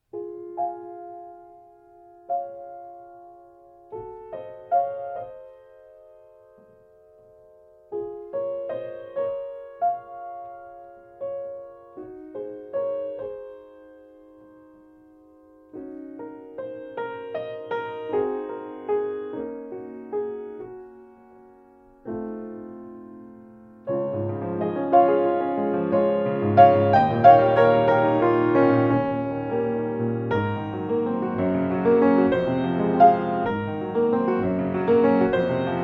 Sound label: Piano